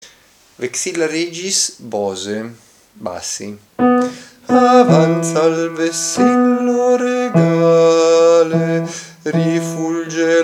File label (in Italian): bassi; Vexilla regis Bose